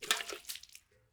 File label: waterbucket throw; Rust